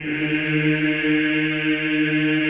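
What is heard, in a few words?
Eeh, choir; Eeh + Ehh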